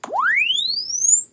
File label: Press Two Sides Chirp; Samsung 4 Recordings